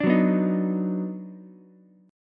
Eb Minor Major 7th Sharp 5th, down, Ukulele; strummed; chord; JGuitar

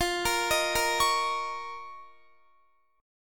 F 7th Suspended 4th Sharp 5th F down Guitar; F7sus4#5; arpeggiated; JGuitar

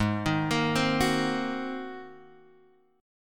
G sharp 9th, Guitar, Open G

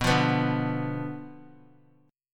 B Diminished B down Guitar; Bdim strummed

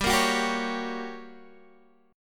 G Minor Major 7th Flat 5th G, Guitar, Drop D; chordshape; down

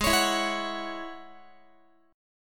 Ab 7th Suspended 4th Sharp 5th Ab down Guitar; Ab7sus4#5 strummed

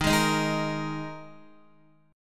D sharp Suspended 4th, Guitar; D#sus4 strummed; JGuitar

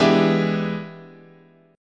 E 7th Sharp 9th, chord

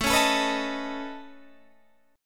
Db 7th Suspended 2nd Sharp 5th A, chord shape

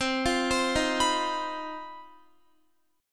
C Minor Major 7th Double Flat 5th chord, Guitar; CmM7bb5; arpeggiated

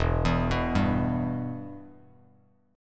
E-Minor, Bass Standard; arpeggiated; JGuitar

